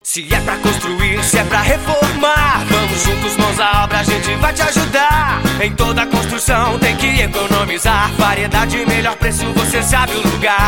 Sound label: jingles